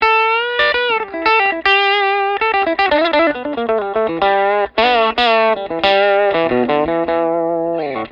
TELE BRIDGE WARM DRIVE OVERDRIVE LICK, t bridge pickup